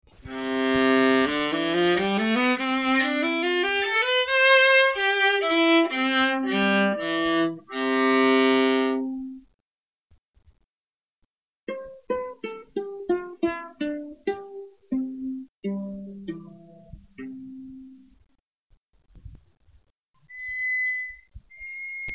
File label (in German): Viola